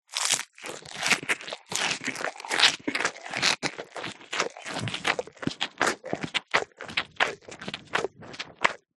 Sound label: animals cow eat grass or foliage; zapsplat